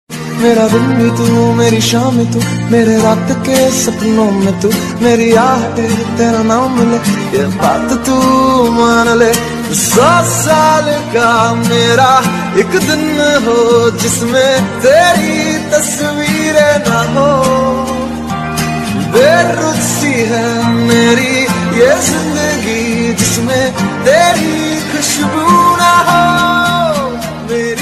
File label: hindi ringtone